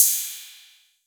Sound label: PERC 808